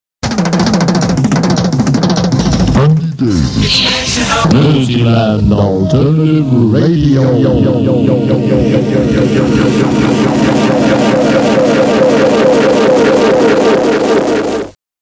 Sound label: jingles; Merseyland Alernative Radioooooo